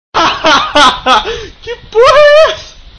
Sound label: hahaha